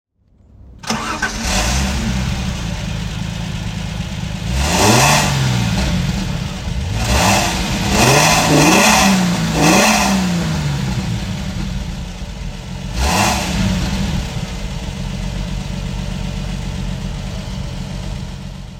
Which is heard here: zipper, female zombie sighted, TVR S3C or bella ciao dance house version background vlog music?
TVR S3C